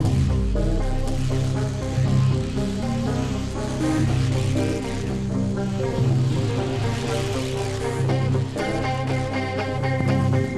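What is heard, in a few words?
music, synth guitar loop; transformers war for cybertron gamerip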